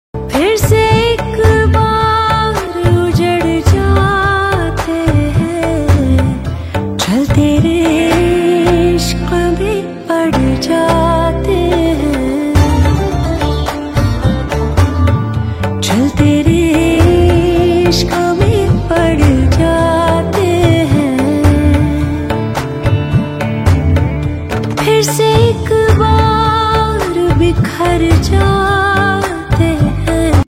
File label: ringtone female version